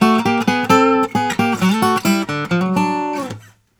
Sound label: Blues Guitar; ILIO; Fretworks; LICK